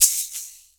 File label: Shaker, EMU PX-7 Drum Machines